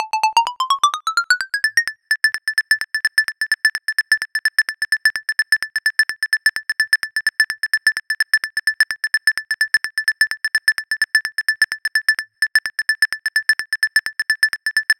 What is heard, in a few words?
pop fx, Key A, Bpm 128, Big Room House; Loop Nerds; Big Room House Allstarz